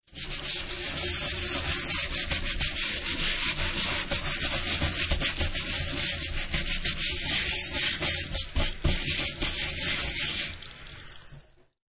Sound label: Energetic scrubbing of a pan with a brillow sponge.; Kitchen Sound Effects; Sound Effects:Household